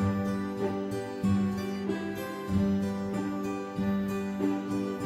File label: intro loop; irish pot luck